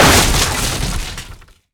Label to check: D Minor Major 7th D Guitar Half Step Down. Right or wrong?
Wrong